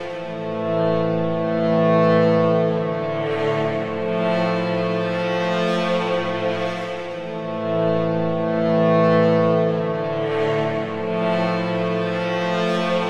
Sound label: synth loop, drum n bass